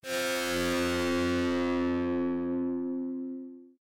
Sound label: fire long chord; Adventure Time - Elemental; ThrillGames